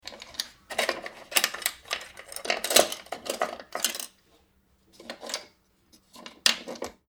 woody tool

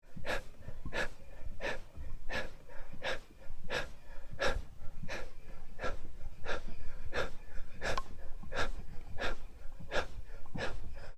breath run slow

